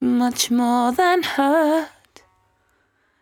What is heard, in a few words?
74-5bpm B, Velvet Vocal; While U Could; Zero-G